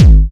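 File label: 909 BD sweet, kick drum; Goldbaby Tape808 & Tape909; The Tape 909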